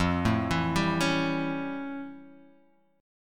F Augmented Major 9th Guitar Open D; 3,0,2,1,4,x

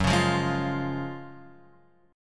F sharp Major 7th Suspended 2nd, F sharp, chord; F#M7sus2 Chord {2 4 3 1 X 1} strummed

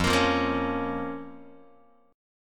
F Suspended 4th Sharp 5th, down, Guitar; strummed; x,1,1,3,2,0; JGuitar

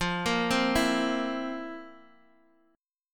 F Major 7th Suspended 4th, Guitar, Drop D

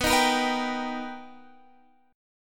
B Augmented Major 7th B down Guitar Standard; B+M7; strummed; JGuitar

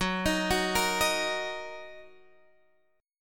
Fsharp 6th down Guitar Open G

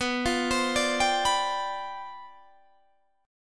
C Major 7th Suspended 2nd Suspended 4th B, chord

